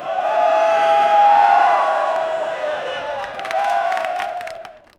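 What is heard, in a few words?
match striking fire whoosh; human activity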